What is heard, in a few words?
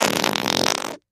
Character Fart Fla, farts